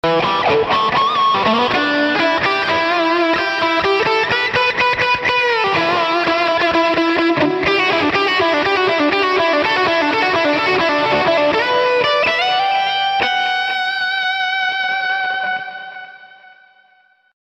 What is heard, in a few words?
Lead; RiffStation Test